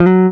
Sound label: GTR TRIK, Jazzistic; Univers Sons